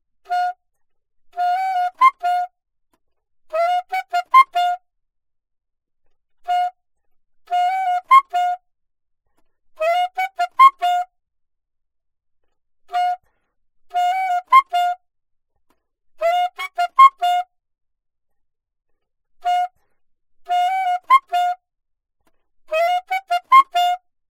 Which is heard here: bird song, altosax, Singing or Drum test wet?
altosax